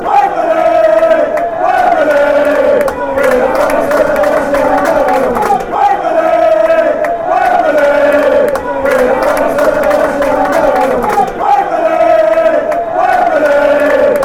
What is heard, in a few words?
wembley, chants